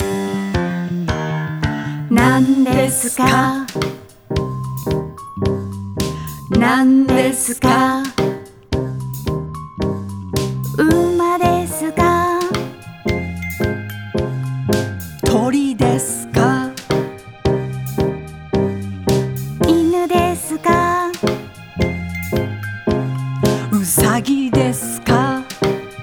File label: song japanese